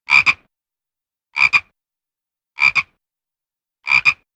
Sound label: Pacific tree frog